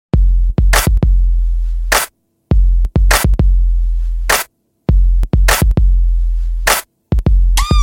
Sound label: rocking tone ringtone